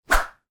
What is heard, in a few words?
whip whoosh